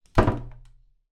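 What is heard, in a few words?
household door wooden internal close med slight sticky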